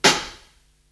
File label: Hihat Foot, Psychedelic Drums